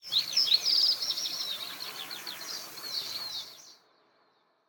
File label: ambience bad birds; smite; conquest